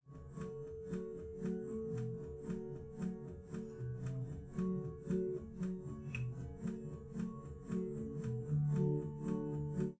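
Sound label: with drums, live rec; SiSEC11; underdetermined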